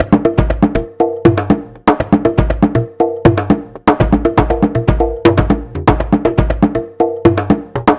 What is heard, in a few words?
Conga Loop, Ethnic Instruments